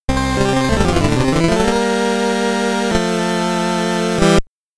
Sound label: Game Over (SID Stereo); Athena; commodore 64